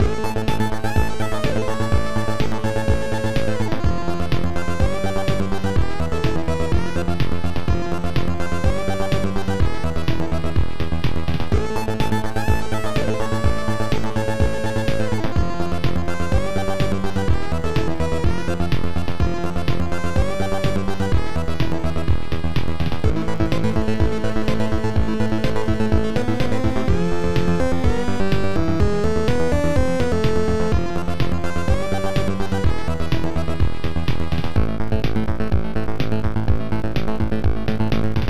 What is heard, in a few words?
KEYGEN MUSIC RINGTONE; KEYBOARD SPECTATOR